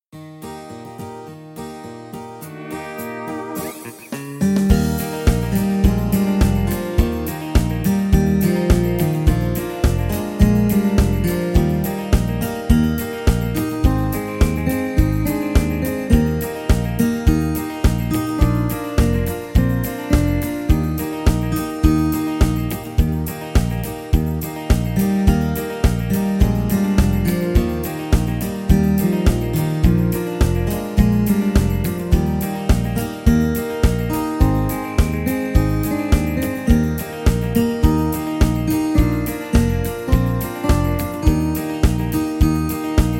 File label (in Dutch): melodie dagenlied